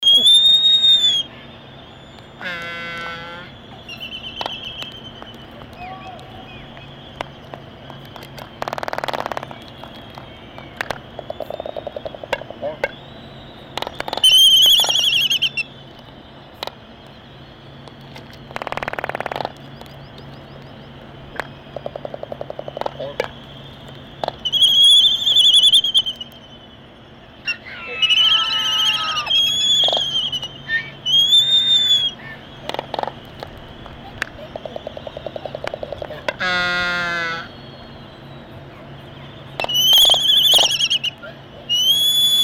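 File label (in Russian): temnospinnyy albatros voice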